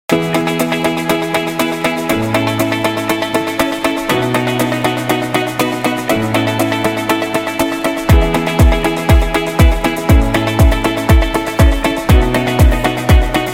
Educational Progress; corporate success

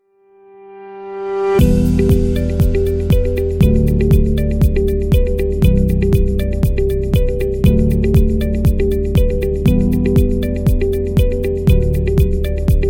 Technology Upbeat Corporate; corporate music vol 4

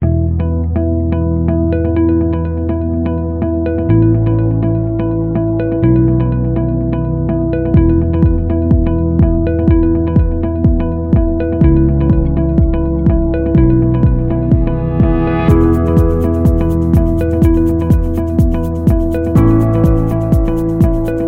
Corporate Uplifting; corporate music vol 9

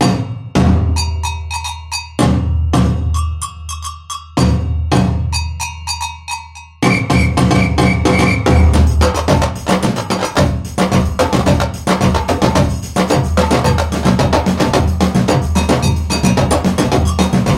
Samba Drums Energy; football drums

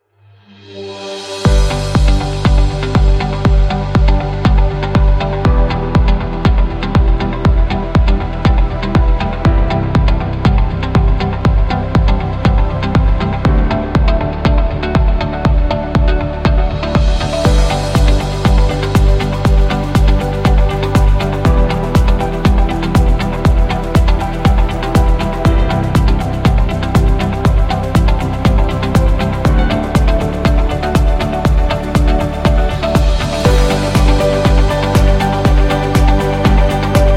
Up Beat

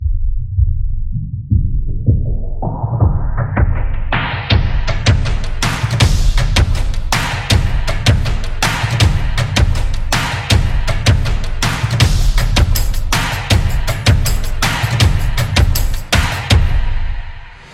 Energetic Percussion; stomp and clap

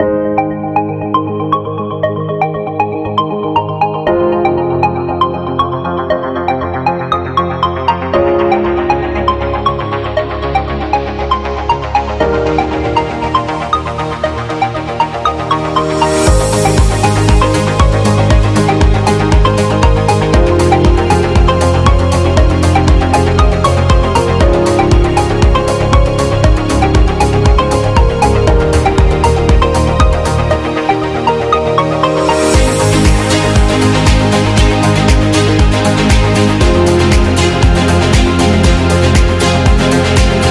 Corporate Happy; corporate promo

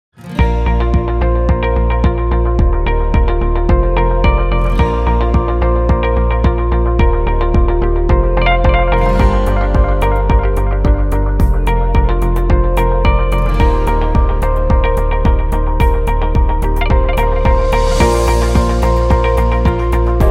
Positive Motivational

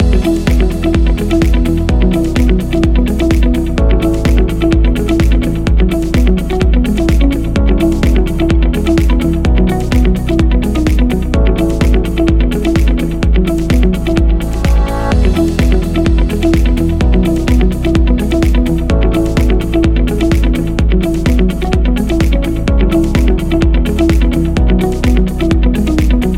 Uplifting Loop; corporate interview

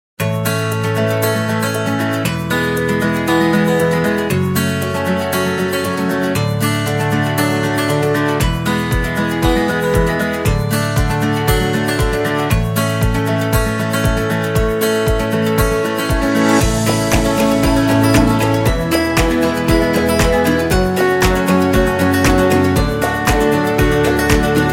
Guitar Advertising

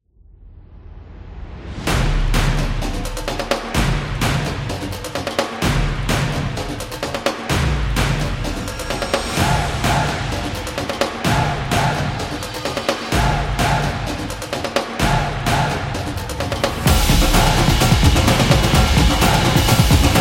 Football Drums

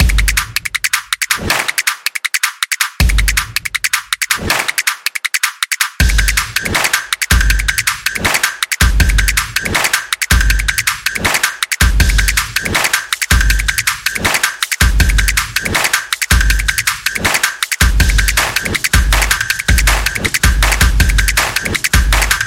Percussion Intro Fast Drum Beat

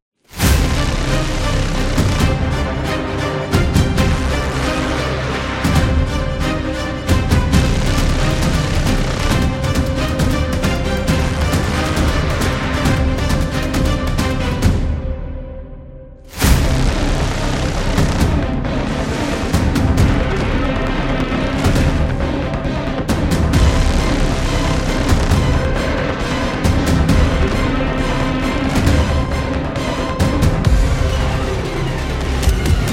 Fast Action Epic Trailer

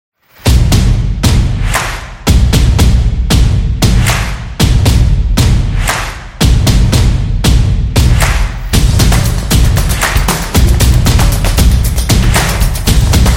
The Drums Beat

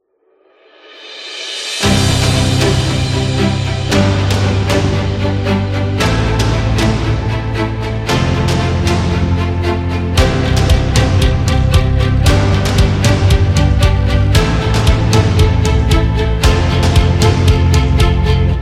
Dynamic

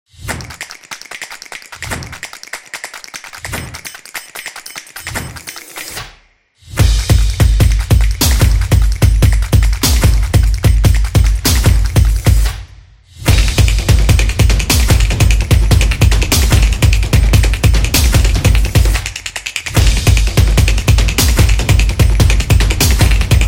A Percussion Solo; football promo

